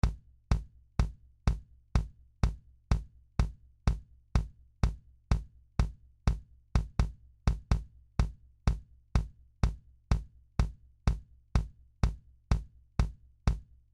KICK

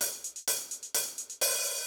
hat loop fill

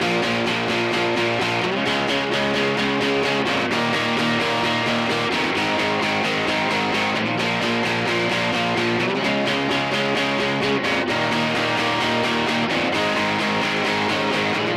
Rhythm Guitar

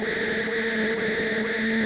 coco loop

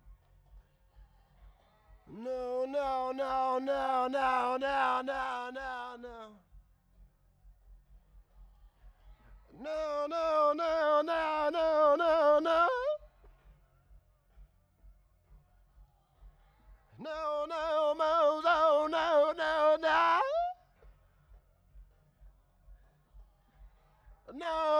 Vocal - End Nos

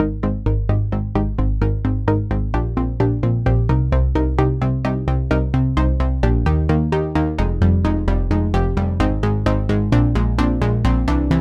Moog (End)

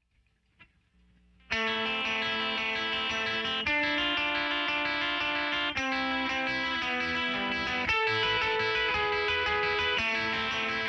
guitar

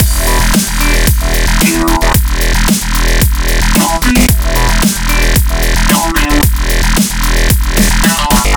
E 112, Discostep Combi Loops; Disco Monster

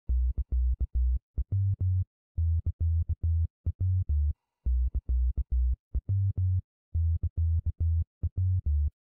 Bass; Remix Sample Pack

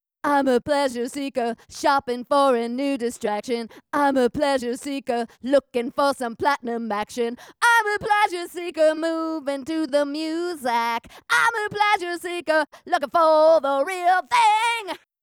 Vocals

